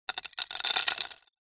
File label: foley stones tiny gravel small amount drop sprinkle on wood; zapsplat